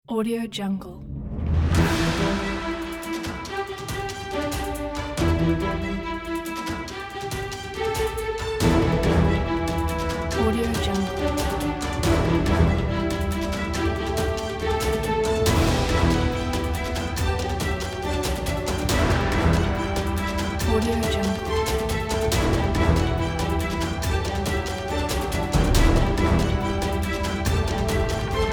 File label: blockbuster trailer